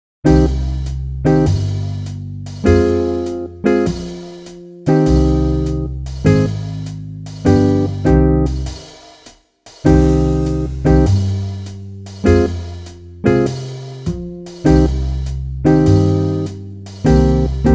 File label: Bb More tied notes